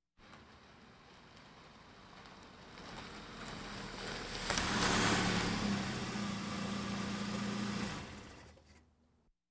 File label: Automobiles various